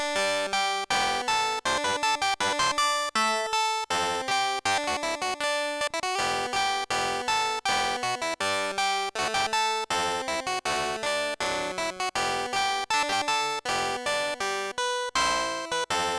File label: Harp Tune, MOS6581R3